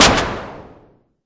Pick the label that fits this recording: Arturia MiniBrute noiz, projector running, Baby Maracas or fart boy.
Arturia MiniBrute noiz